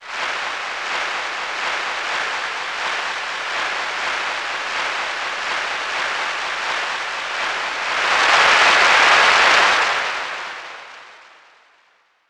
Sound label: applause bass